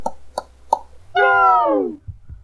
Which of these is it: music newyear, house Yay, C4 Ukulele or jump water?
house Yay